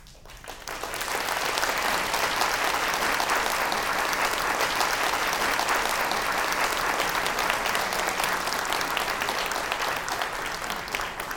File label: short clap